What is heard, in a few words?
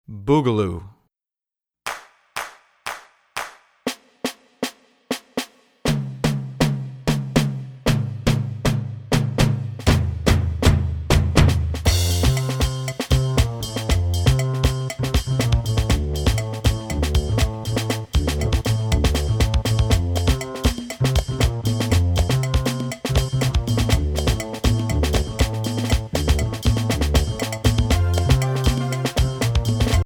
Boogaloo